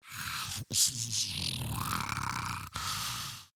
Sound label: Breathing Grineer Ghoul; Grineer Ghoul Expired; Battle Barks